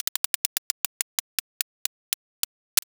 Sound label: roulette